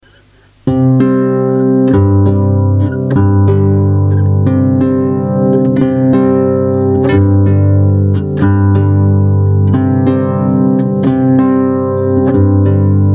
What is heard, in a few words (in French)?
polka